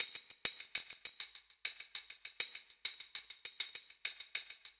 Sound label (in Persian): صدای تامبورین; kit drum rhythms